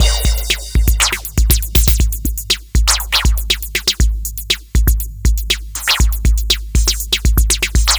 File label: Drums Strange; Sonic Foundry (Sony Creative Software); Machine Language